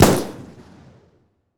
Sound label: Sniper Shot; This Is the Police 2; SFX Tactics